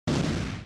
Ship Misc Medium Hit

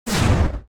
Ship Weapon Spinal Projectile

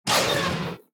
Ship Weapon Guided Antimatter

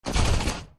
Ship Weapon Project Particle

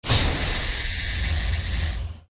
Base Weapon Point Defense

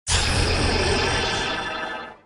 UI Level up

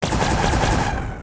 Base Weapon Sentinel Mount

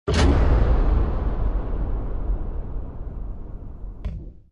Global Cargo Collection

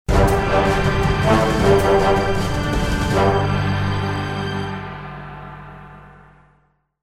MUSIC Victory

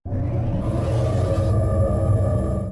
Base Construction Start

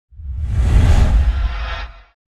Ship Weapon Spinal Projectile Charge

